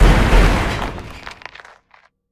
mine Cart

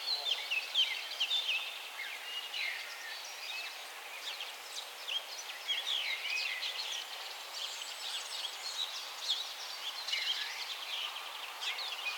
Maze Oil Painting Amb Loop; Sx Maze Oil-Painting Amb Loop 01

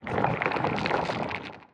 Earth Quag crawl

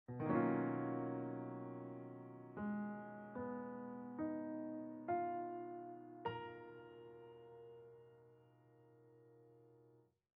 Piano End

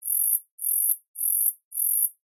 Grasshopper Chirp Loop; Botanist

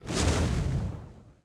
Fire totem shoot

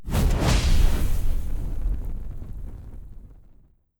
Fire Whip

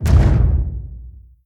Monster Foley Footstep

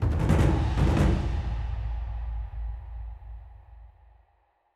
bgm transition music segment; Monster Hunter Rise; Xbox Series X/S

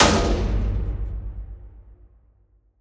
Clef Hit; Engineer

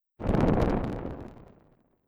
Flame

